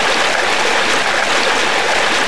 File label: fountain; cs jackpot v2